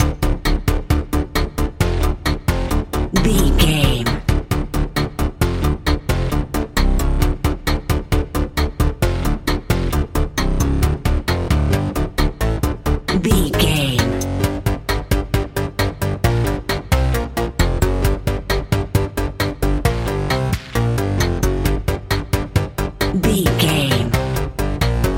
character selection screen video game

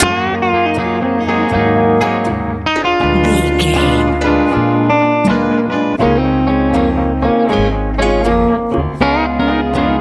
blues country

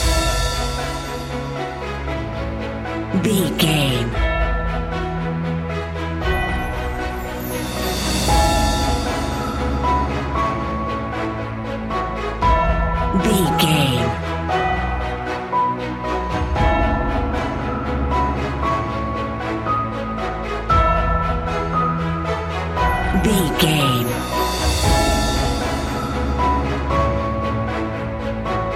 cinematic corporate loop

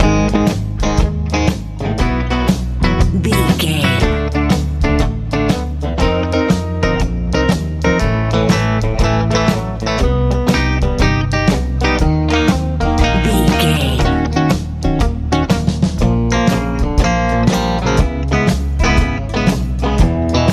country rock

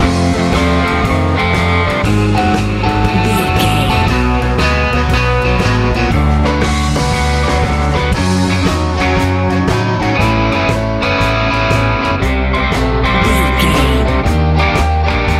sunny hot texas rock

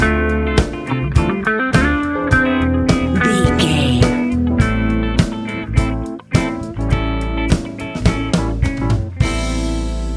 midnight disco

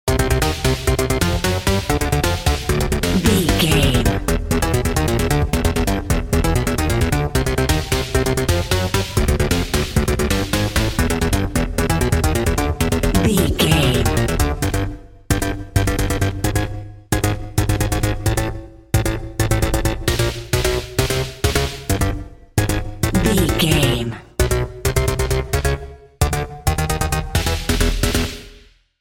intense video game level

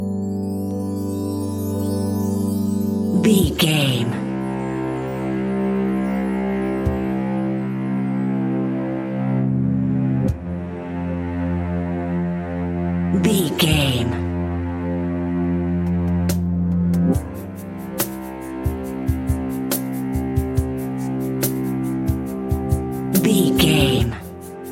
drums and bass

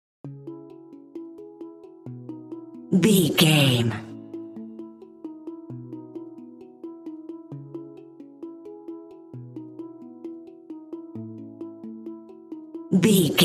warm poignant hang drum and mallets

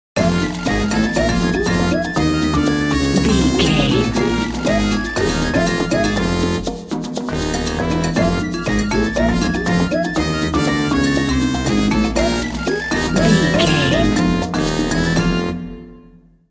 jungle hijinx bumper

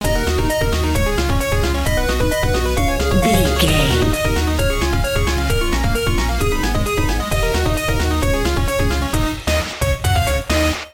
big boss battle video game bumper

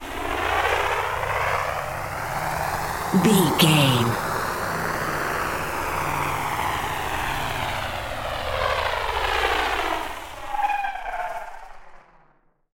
ominous war plane

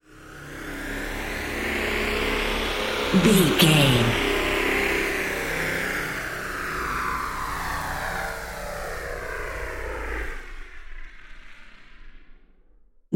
ominous war plane heard courtyard

